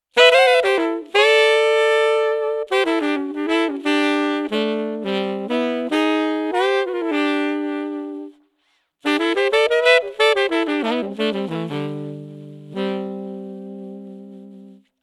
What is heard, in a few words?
Pitchfactor Dry 4th Up; Tonal Reproduction (Saxophone); Eventide Pitchfactor; Pitch Manipulation Pedals